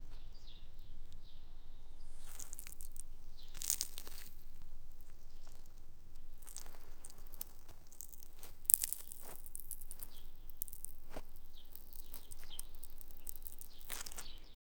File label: grinding stone on stone, cooking